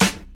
clean acoustic snare sample b key, snare sample tuned to the B note; snare drum samples